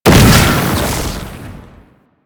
Archgun Projectile Empowered Start; Warframe; Archwing

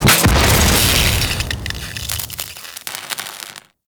Security Trap Electric Explosion; Grn Forest; Veilbreaker; Grineer Forest Thumper; Kahl

